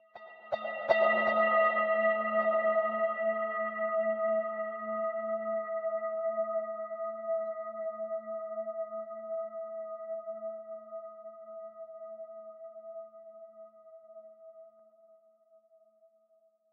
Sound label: ambience magic good; smite